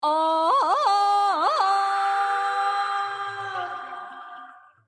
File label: tarzan yell